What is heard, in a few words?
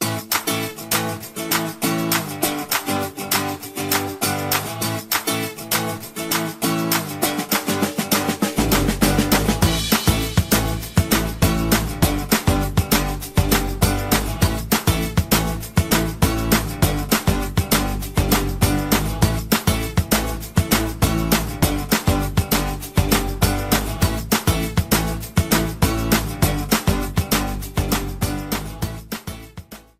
Bonus Game